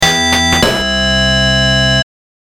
jingle; sega

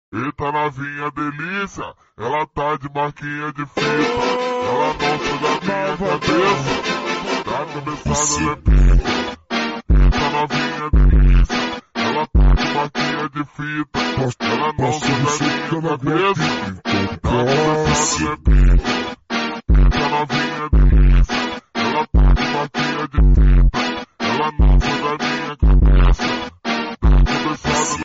phonk; trollface

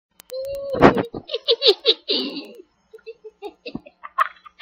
hihihi

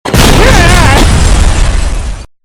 explosion

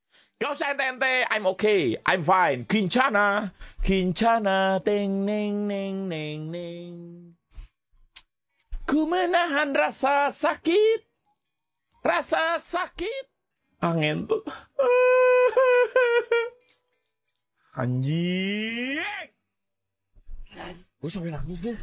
vocal only

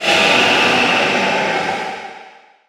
roar distant; roayl ludroth

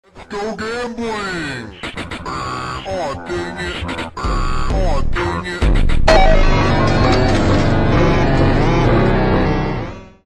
phonk; lets go gambling

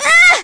yelping; tails

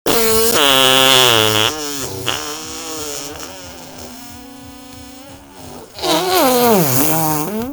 the fard button

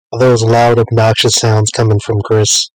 obnoxious sounds

